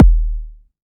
digi; Roland MV8800; MACHINE KIT1

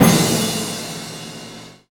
HIT, Orch Kit; Roland LCDP03 Orchestral Perc; Orch Combo 1

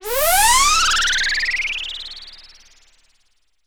SPACESIP GO; AKAI S6000 CD-ROM - Volume 6

